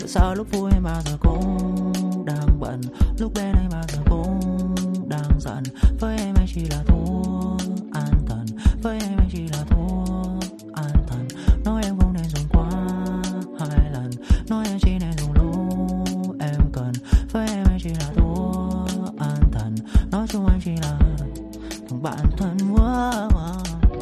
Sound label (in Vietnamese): Nhac Chuong